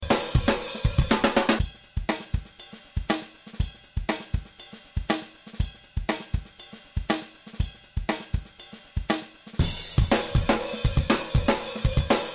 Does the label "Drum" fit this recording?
Yes